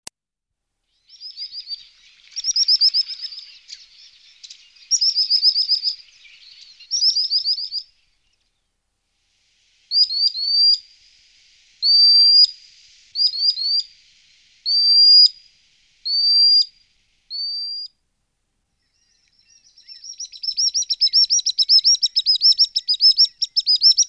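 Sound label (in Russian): perevozchik actitis hypoleucos, common sandpiper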